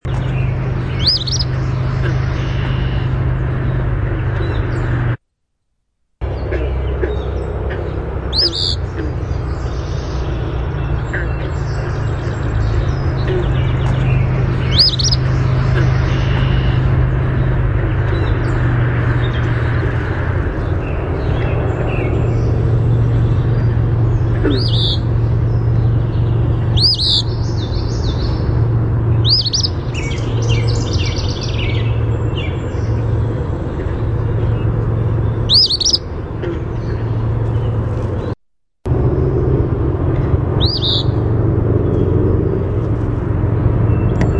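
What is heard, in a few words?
song, eastern phoebe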